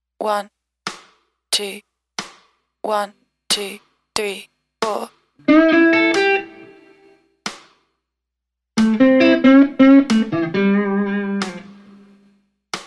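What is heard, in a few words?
metronome 2count offbeat with guitar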